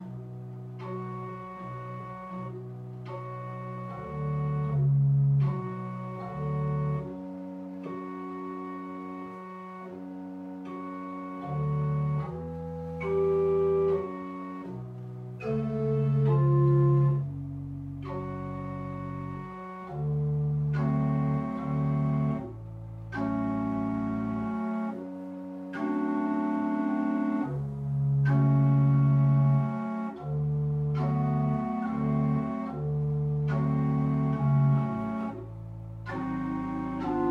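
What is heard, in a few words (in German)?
Schulte, pfeifenorgeln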